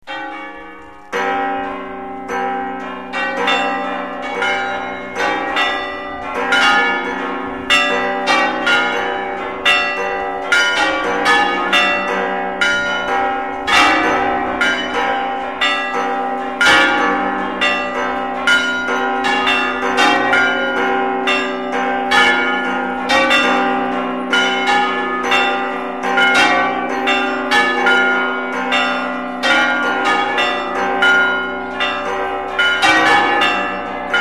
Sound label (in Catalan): campana